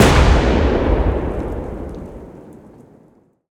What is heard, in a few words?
explosion outdoors large; bluezone; sonniss gdc